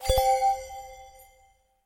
cura

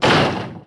cerrar madera, puertas; ruidos

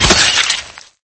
caida

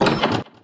abrir madera, puertas; ruidos

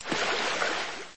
nadar

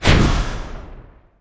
oportunidad acierto

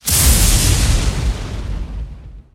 llamar rayos impacto

